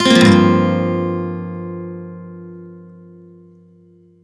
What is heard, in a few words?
G min11 upstroke, Acoustic Guitar; Sonic Foundry (Sony Creative Software); Songwriter Acoustic Guitar Companion; One Shot Chords